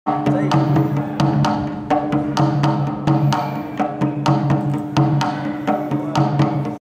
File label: Nagara, ساز ناقارا